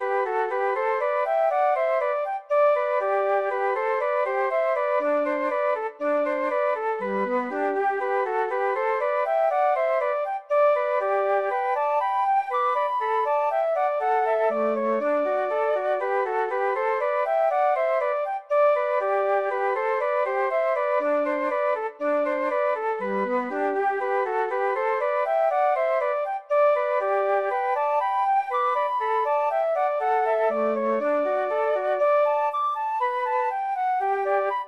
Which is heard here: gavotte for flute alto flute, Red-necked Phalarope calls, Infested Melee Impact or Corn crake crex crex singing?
gavotte for flute alto flute